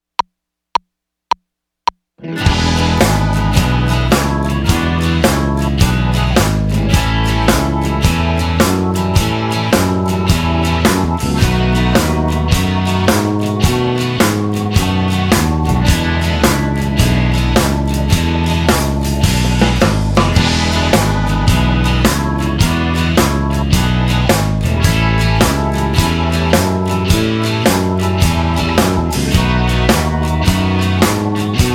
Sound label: Blues W/O Guitar